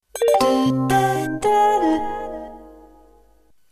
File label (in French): sncf jingle